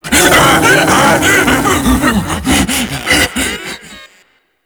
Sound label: Pre Death, Vocals, Enemies; Warframe; Proto Infested; Ape Prelate